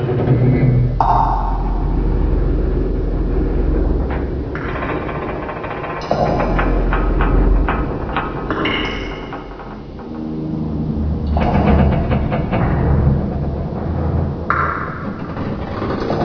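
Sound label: ambience stress drippy; tank farm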